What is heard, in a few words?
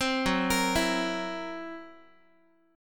Fsharp 7th Flat 5th down Guitar